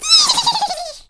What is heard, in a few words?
Rat Trapdoor